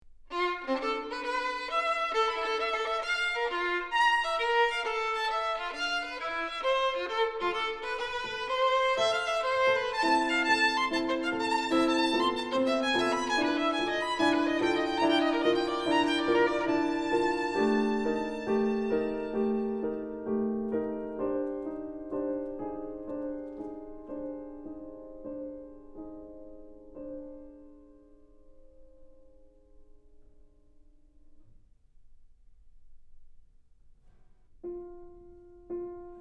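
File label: violin piano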